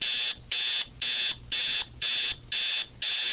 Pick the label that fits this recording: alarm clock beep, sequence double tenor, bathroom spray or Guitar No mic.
alarm clock beep